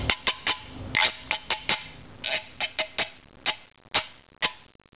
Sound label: 3 way wood scrapper